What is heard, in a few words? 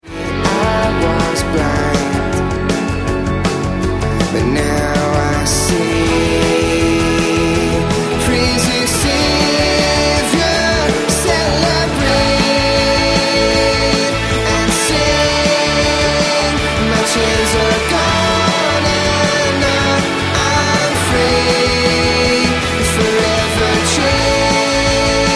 celebrate